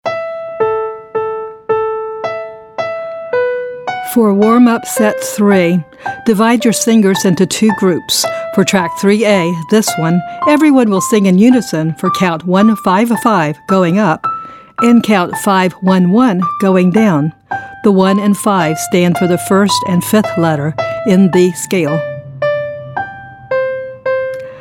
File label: Warmup Count Unison